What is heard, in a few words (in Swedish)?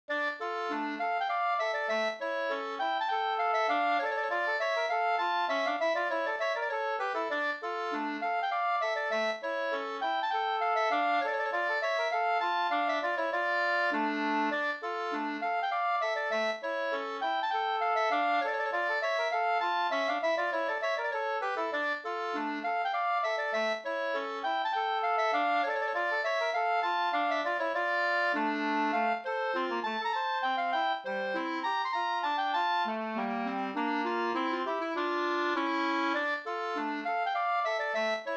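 slangpolska, two-part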